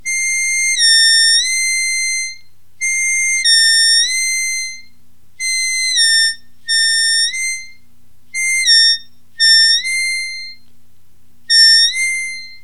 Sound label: bending, harmonica